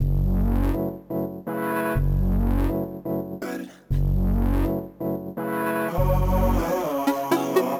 F# 123 Dry, MELO + FILTER; Bunker; RoBKTA Sample Pack Supreme

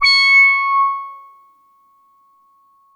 C#7, Boom da Bass; E-MU Producer Series Vol. 6 – Analogia Project (CD 1)

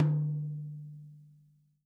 tom, Real Drum Kit, funk kit

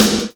GATE SNARE

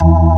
ORG, Organs

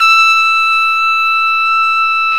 TRUMPE; Trumpet; Sound & Vision - Gigapack II CD 1

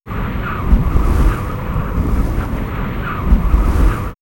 Nature Spooky WInd; X-Static Goldmine

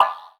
One Shot Snare, Drum N Bass - Percussion

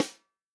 snare drum, HIPHOP SNARE CHROMATIC; ProSamples vol.43 - Real Drum Kits

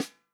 FUNK SNARE CHROMATIC, REAL DRUMS

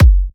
F# kicks; markus hakala kicks

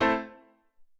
Tek Hit

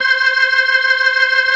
C5F, B3

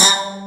SYN MOUTH, BASSES; Pro-Rec - Synthsonic

